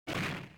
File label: hit; fujitsu